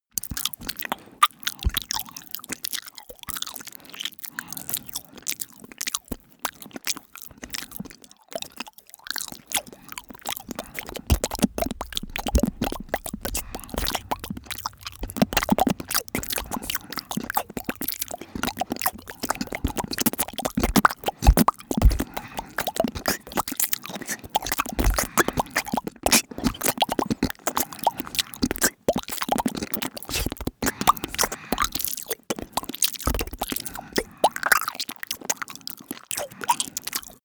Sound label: smack lips; soundpool